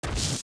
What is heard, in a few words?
Bush Pickup; zelda; OOT